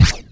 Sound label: rocket; quake; halo; player